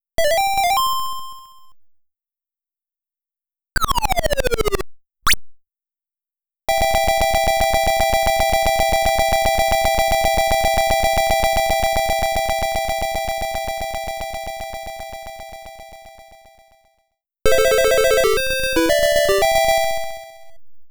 Extend, Miss, Roulette, Success, Failure

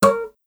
Rhythm Whoops; super crazy rhythm castle

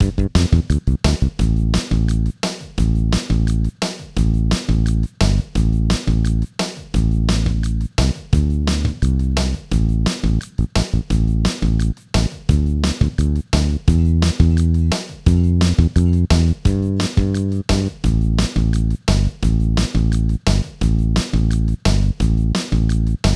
Music Tutorial heroic

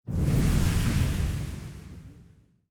dark spell get

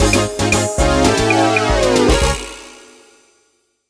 Bogie; Super Monkey Ball 2; Monkey Golf 2

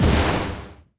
Shockwave; New The Denpa Men

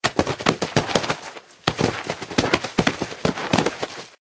Body Scuffle

